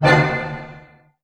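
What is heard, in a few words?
A diminished pianissimo, orchestra hits; Sound On Sound - Peter Siedleczek's Orchestra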